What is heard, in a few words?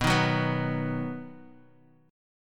B Major, Guitar, 4F,4A,5C,5G,5C,6E; strummed; 6,6,6,4,x,x; JGuitar